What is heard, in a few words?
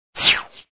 Close Instructions; Tails Nightmare